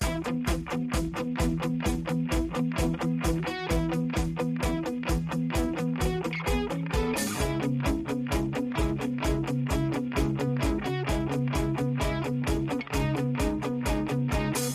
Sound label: music cars splash menu; lightning speed